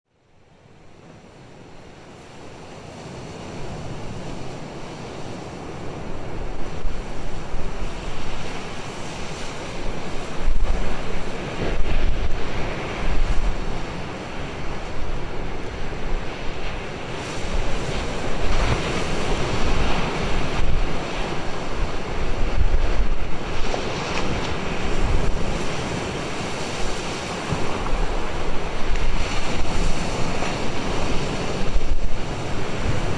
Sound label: Ocean